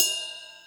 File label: Hithat